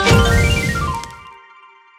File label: prize hit; Fenix Play 27 Deluxe; Wazdan